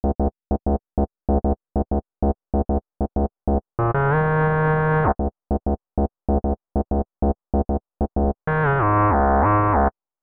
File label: bassline processed